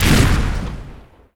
Circle Laser Bomb; gamerip

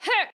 Swipe; Jackie

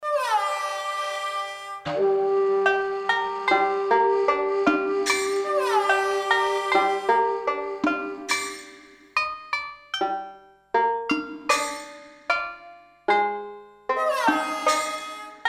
Incidental BGM; Smeshariki Cartoon Studio

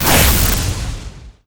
Enemy Thunder Bolt Hit; gamerip

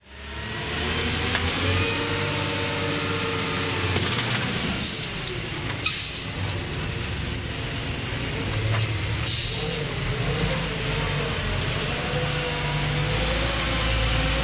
Hamburg Crane

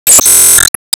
Ingame Bonus

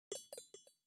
Footstep Stinger; StyxMech

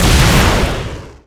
Enemy Candledevil Strongattack Shot

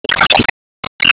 Enemy Bigclam Active Shot

